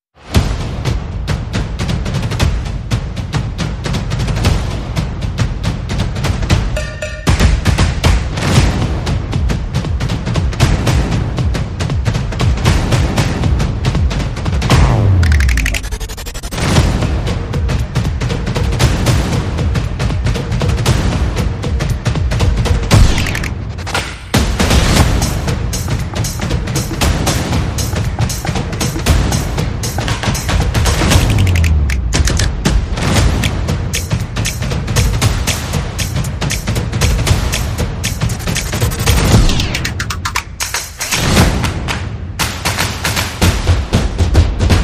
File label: Percussive Bonanza, Percussion and Effects